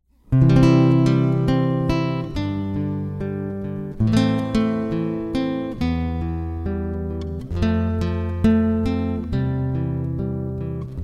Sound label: Canon in D, Solo Guitar; J. Pachelbel